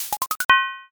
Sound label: transform mech